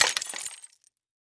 Crash Debris Glass Debris Dropping On Pavement Short; Crashes